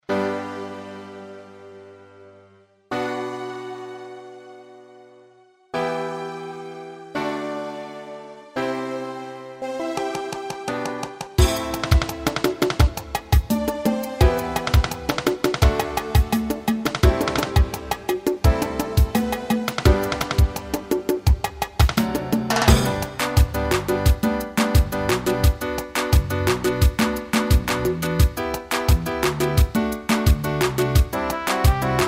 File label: Salsa Orquesta